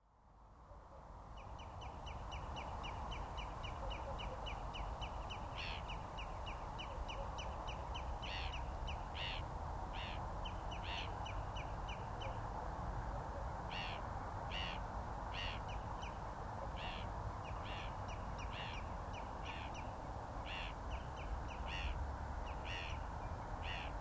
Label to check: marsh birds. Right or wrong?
Right